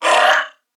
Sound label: Pain Corpus Venus Male, en; Dialog; Barks; Battle; Warframe; CorpusVenusMaleB